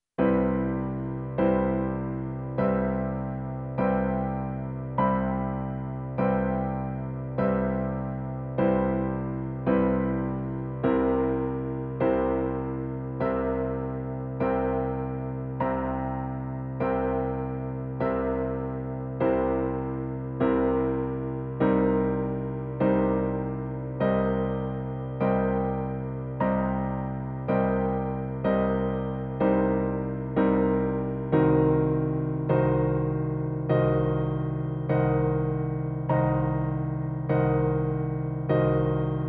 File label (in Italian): fisarmonica accordion, pillole di jazz accordi a quattro voci